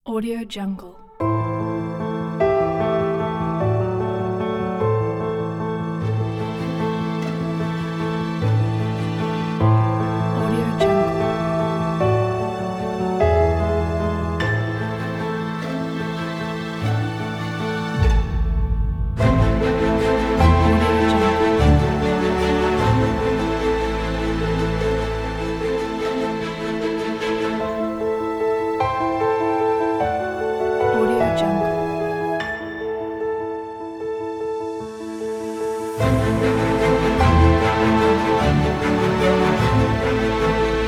inspiring piano

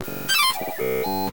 retro 8bit

